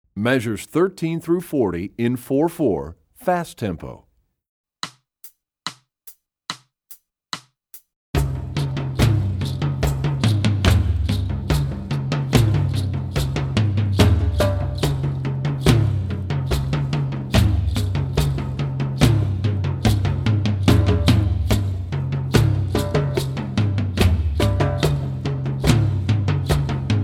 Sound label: Rhythm Studies; EE2000 Book2